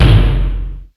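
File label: Machine Gun Explosion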